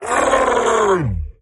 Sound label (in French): attaque, monstres; deadmaze